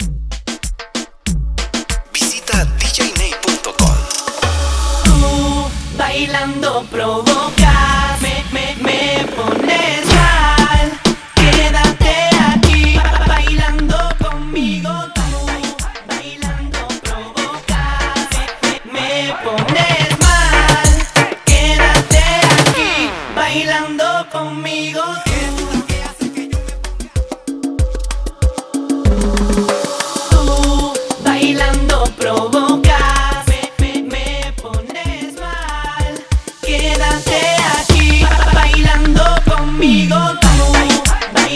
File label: Acapella